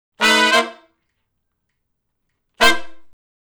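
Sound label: R&B Riff (C) harmony; Sections; Crimson Blue and Fabulous Horncraft 4 RnB; Horncraft for R&B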